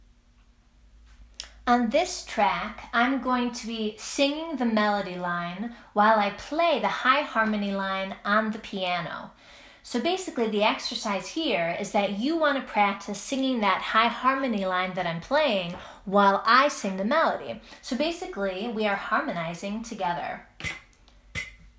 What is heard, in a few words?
Lead High Harmony